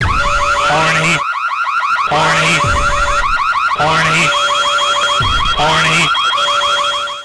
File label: horny alarm